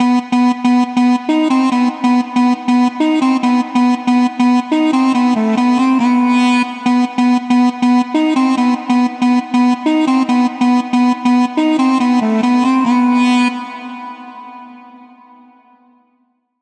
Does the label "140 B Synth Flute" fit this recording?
Yes